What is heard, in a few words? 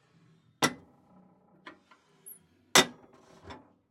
Woodstove, Door, Iron, Close; UberDuo; The Wood Stove Audio Prop Set